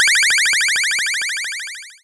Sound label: fall; gimm-platformer-project